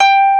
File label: HARP; East West; Global Sounds